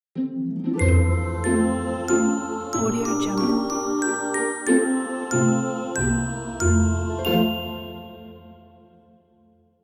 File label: happy logo